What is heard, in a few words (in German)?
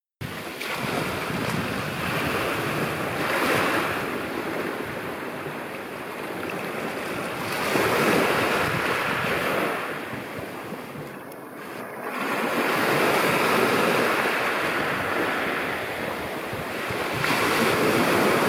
Playa Jeremi (Original Video Ton), Curaçao; Fieldrecordings